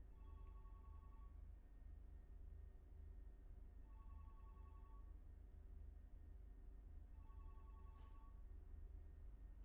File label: phone; IEEE AASP